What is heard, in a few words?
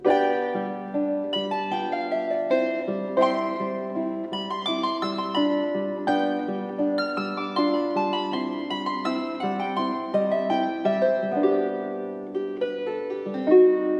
harp piano